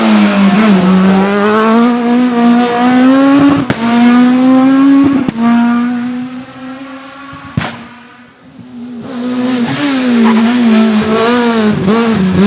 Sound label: Renault Clio S1600 with Pure Sounds Loud Backfires Accelerations